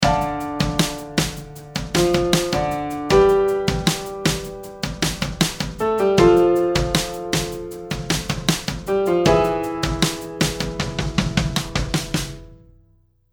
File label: 4 bar full loop 78bpm; sound bites resource kit